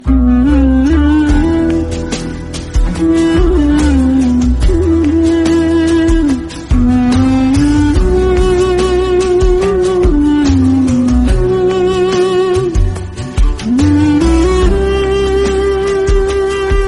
turkish

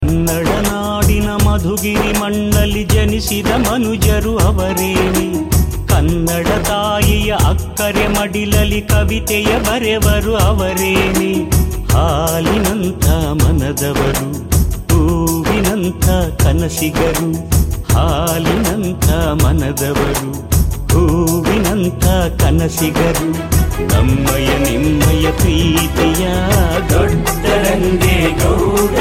kannada ringtone

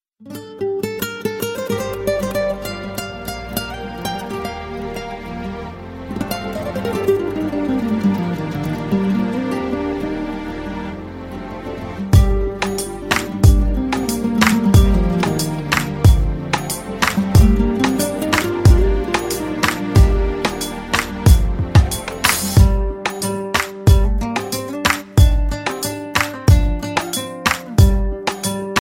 guitar music, ringtone

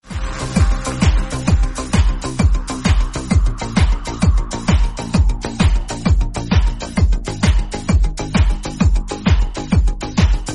german techno; ringtone

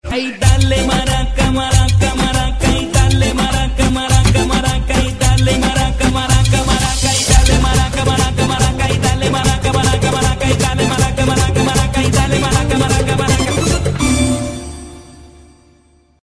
maraca

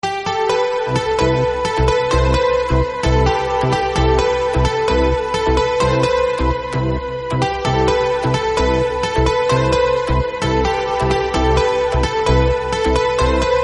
romantic tone ringtone

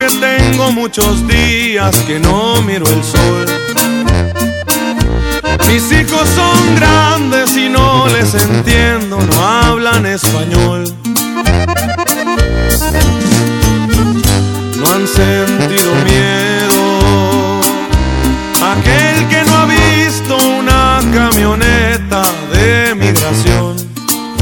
corrido

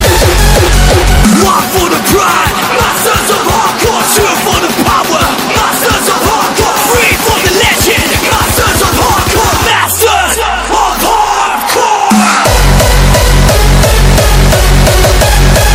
nice austrian

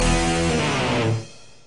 guitar

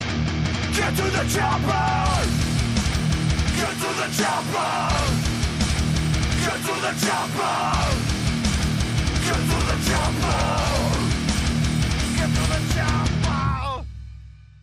austrian sms ringtone

